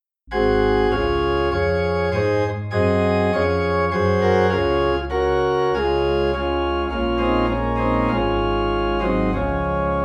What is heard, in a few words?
Organ in D Flat; He Leadeth Me